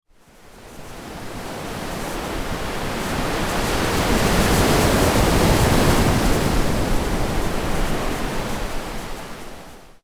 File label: Ocean waves